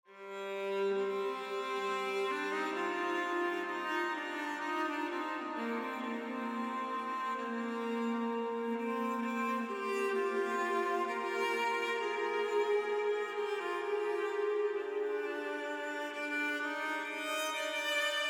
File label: Exploration Mesa Outskirts